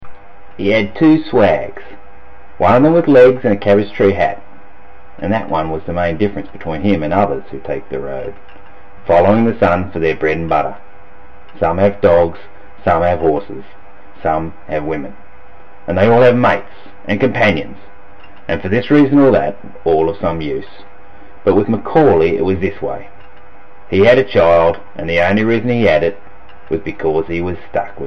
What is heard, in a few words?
Tunnel